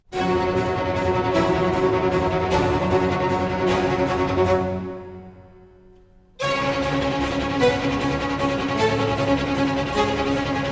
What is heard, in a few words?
Summer Presto; III. Presto